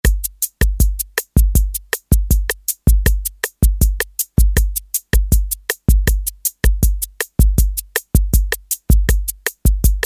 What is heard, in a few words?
BOSSA NOVA, Roland TR-808; Factory